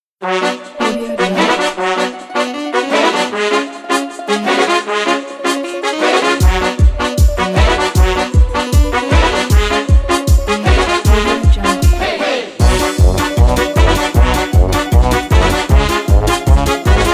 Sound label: balkan rhythms